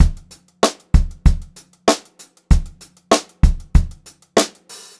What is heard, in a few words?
Pop-Rock Drumloops; 96 POP 3